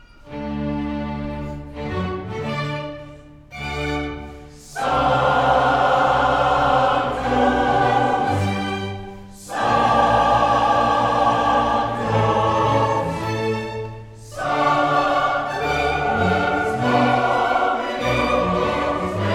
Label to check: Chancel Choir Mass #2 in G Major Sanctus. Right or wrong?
Right